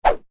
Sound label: cable whoosh air; foley; McKinney Sound